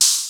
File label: Hi Hat, Cwejman S1 MK2